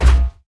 Footsteps; SFX Character

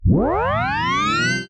Tape Start

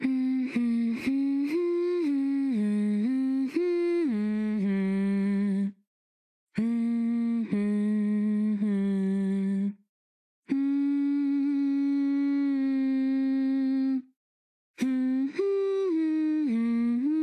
Theme Humming Soft; the letter a horror visual novel switch gamerip 2021; The Letter Theme Humming Hannah Soft

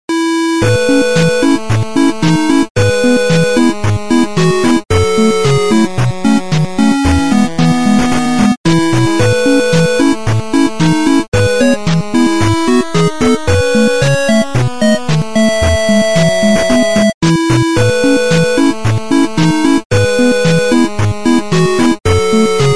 A Brisk Walk 8-Bit; Ar tonelico: Knell of Ar Ciel

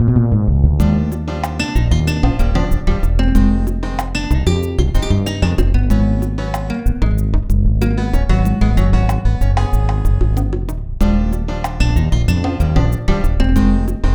Dressing Room; Centre Court Tennis; N64

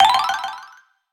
Item get; The Denpa Men 3: The Rise of Digitoll

